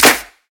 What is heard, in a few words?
clap sample c key, Royality free hand clap sample tuned to the C note. Loudest frequency: 4565Hz; percussion; single hits one shots